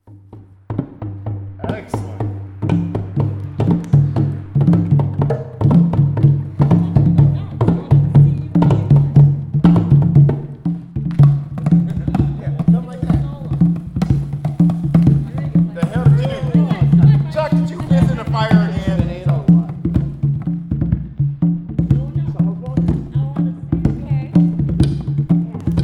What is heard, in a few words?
Drum Circle